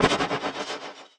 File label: Snare, One Shots, Drums; Touch Loops; BlissedOutBeats